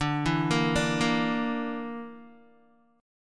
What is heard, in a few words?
D Suspended 2nd Sharp 5th D down Guitar; Dsus2#5; arpeggiated; JGuitar